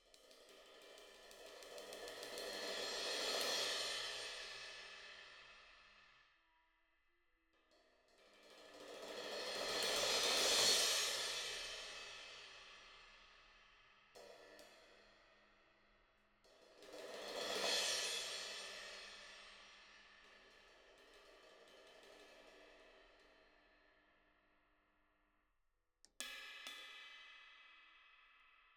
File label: Cymbal